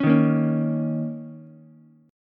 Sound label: A sharp Minor Double Flat 5th A sharp, Ukulele; strummed; 6,3,6,4; JGuitar